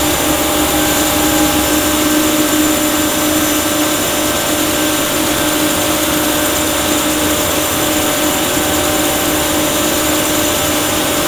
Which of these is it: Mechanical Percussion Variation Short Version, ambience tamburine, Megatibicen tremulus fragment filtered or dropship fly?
dropship fly